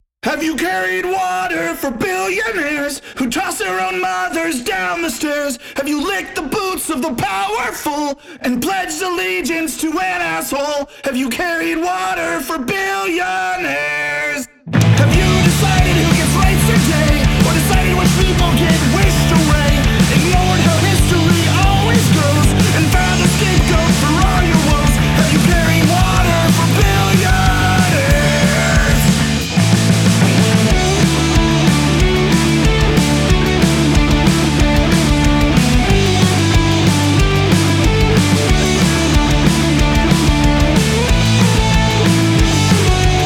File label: Blues